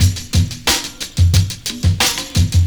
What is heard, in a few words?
PERCS, 70 s Breakbeats